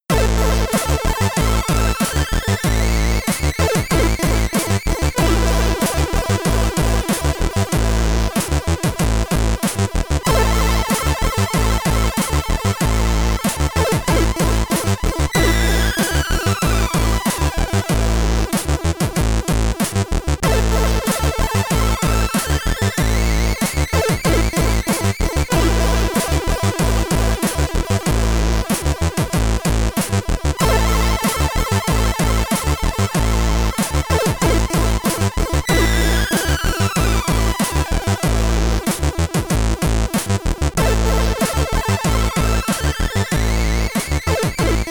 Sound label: Loading Tune (SID Stereo), commodore 64; Tales of Boon